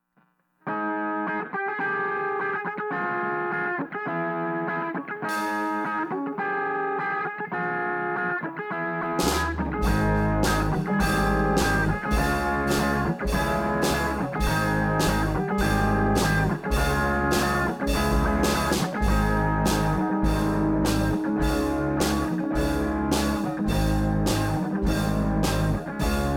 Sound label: idea full Rough Mix